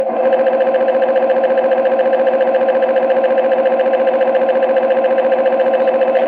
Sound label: Example of MRI sounds